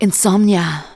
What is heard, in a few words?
vox, Techno Trance; Ueberschall